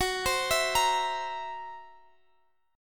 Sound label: C 7th Flat 5th, F sharp